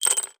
yut sticks; mir2; crystal

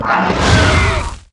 pet black dragon; mir2; crystal

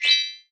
flash box; mir2; Return of Legends

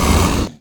wolf attack; mir2; crystal

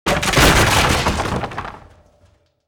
destroy car; mir2; Return of Legends

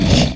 wolf struck; mir2; crystal

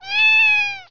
pet kitty; mir2; crystal

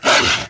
wolf attack; mir2; crystal

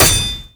struck; mir2; crystal

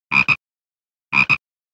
pet frog; mir2; crystal

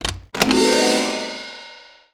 open box; mir2; Return of Legends

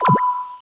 pet pickup; mir2; crystal

